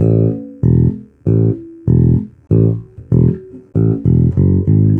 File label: Country, Rock, Bass; ACID MUSIC